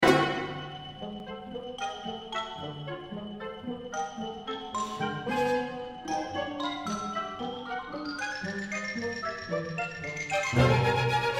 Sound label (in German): Xylofonpassage; Cerha; Bagatelle fuer Orchester